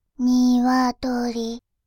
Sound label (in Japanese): ニワトリ; バイノーラル右